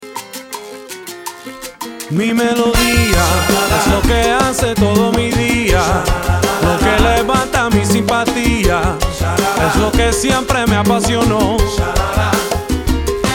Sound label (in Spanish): Salsa